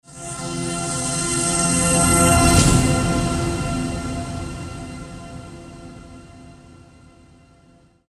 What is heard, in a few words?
swell accent